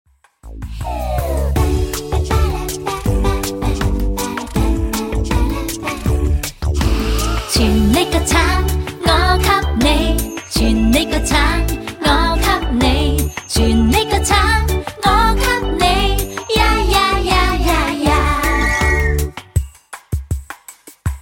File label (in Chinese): 無對白, 正向心理兒歌